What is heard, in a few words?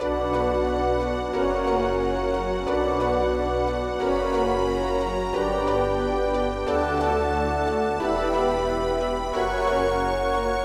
Menu Pattern